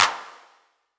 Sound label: clap trail; M8